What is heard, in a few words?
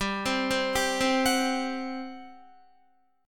G Major 7th Suspended 4th, G, down, Guitar; GM7sus4; arpeggiated